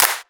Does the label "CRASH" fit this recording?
No